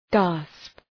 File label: gasp